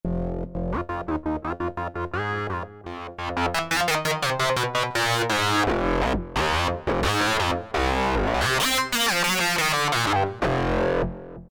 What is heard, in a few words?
Lead, Alesis Micron; Factory Programs; Flatulent Duck